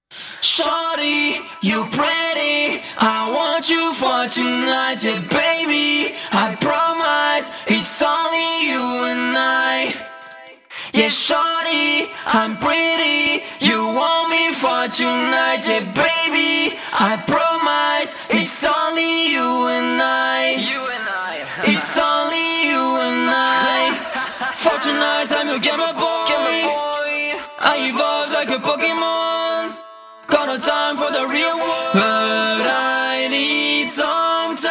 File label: pop 90 Em